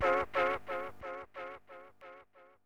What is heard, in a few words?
stabbing, stabs